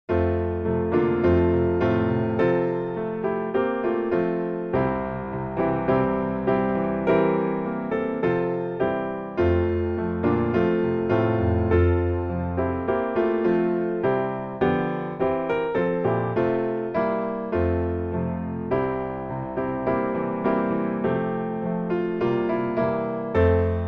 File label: piano